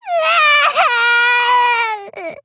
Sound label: cry; himiko